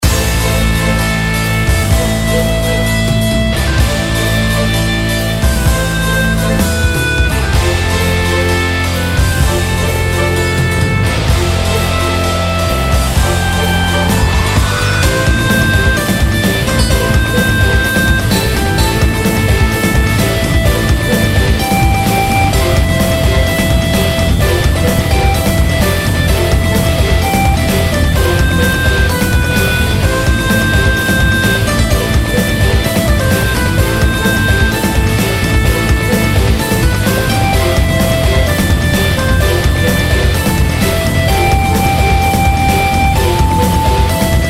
Raid Boss; Mist Train Girls

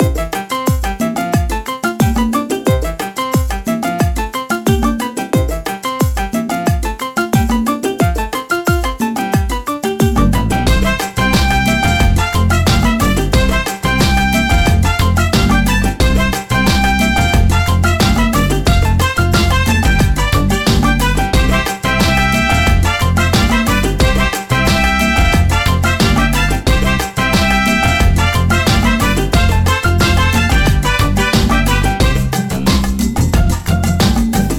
Pinata Music; Spanish

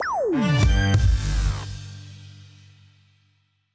Jingle Mission Start

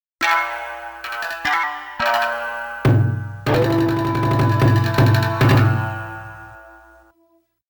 Victorious Battle

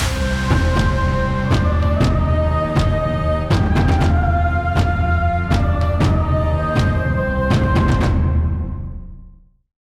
mood Bosque

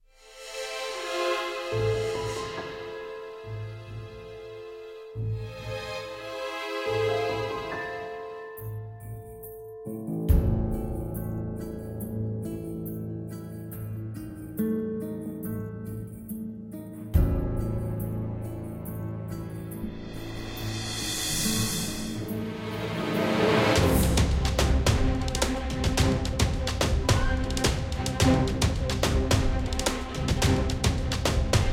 Timeline BGM; AFK Journey; Android

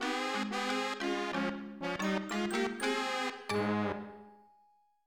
Sports Festival Mini Game Losing Jingle; tokimeki memorial 3 yakusoku no ano basho de; ps2

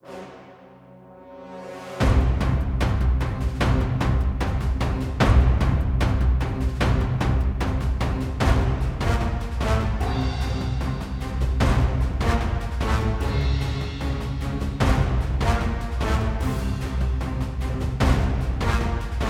Music Light Combat

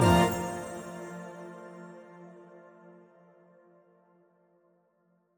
Jingles PING; Semblance

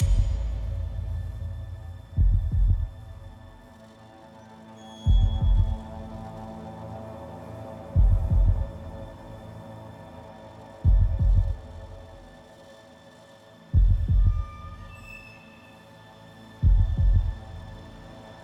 Boss Fight Ambience; Landinar Into the Void

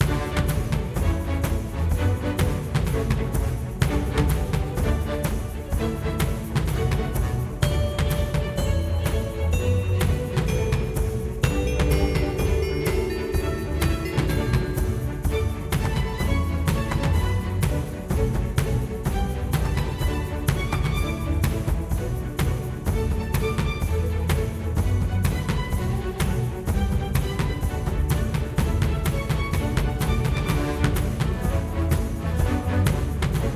Battle Christmas; Xuewang

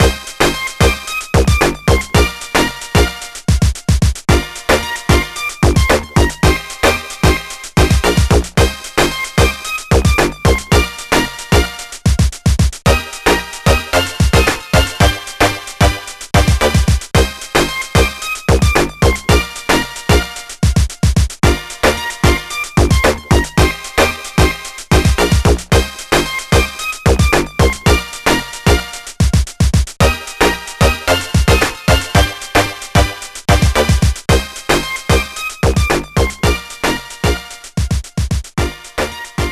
Rhythm Minigame (Cafe); ever girl; gba gamerip 2005

